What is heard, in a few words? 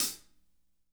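hat closed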